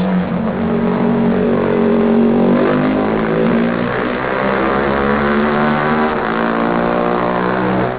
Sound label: NSU rennmax